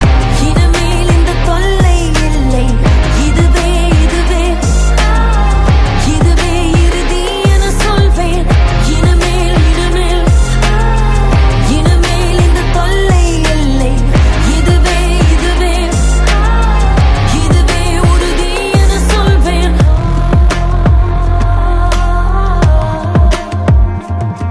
Tamil Song Ringtone

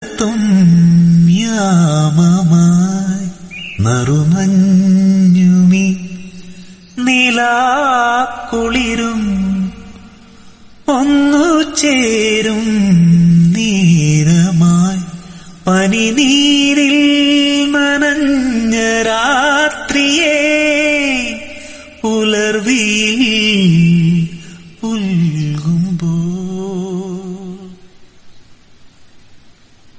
Tamil South Indian

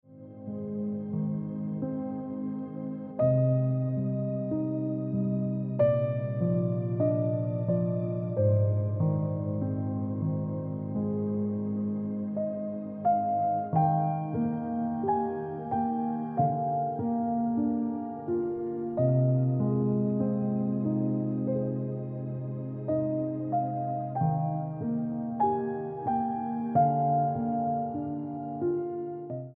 Piano Music

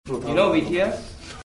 Message Tone Notification

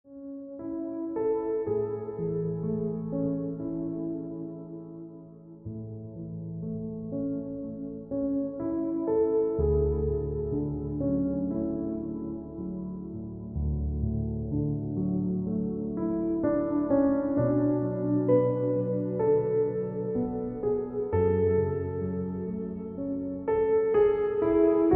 Ringtone Piano Music

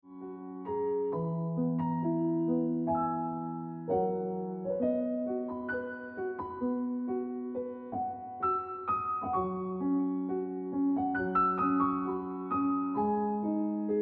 Piano Music

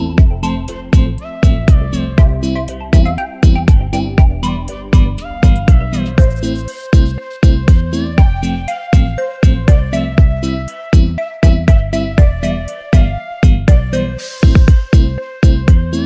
Remix Realme Ringtone

